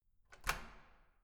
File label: door key lock